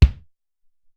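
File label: Studio Kick, Alesis SR-18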